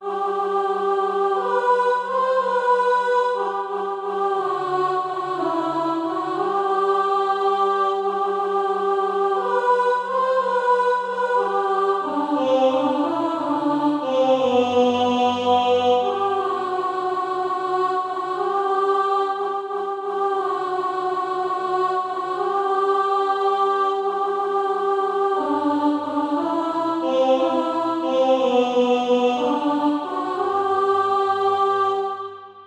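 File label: alto, Practice Music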